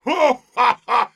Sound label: laughter big, es; Heavy; Risa grande 2